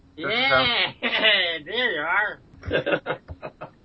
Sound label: interview laugh